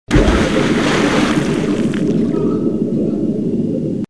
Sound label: Drowning (Water); Mortal Kombat Mythologies Sub-Zero